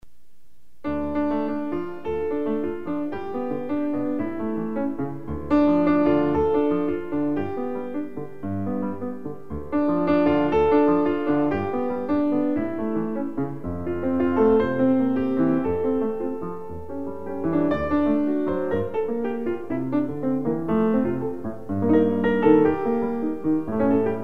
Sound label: piano tumba